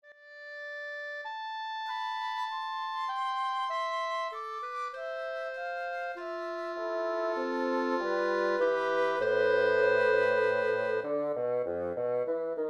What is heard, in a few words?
Lonely Waltz for Woodwind Trio